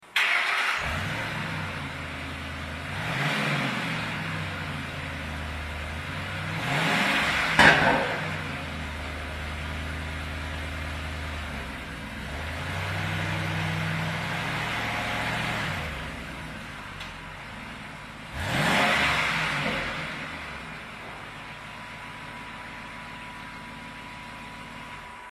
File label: Expedition dual Tpipes, custom exhaust systems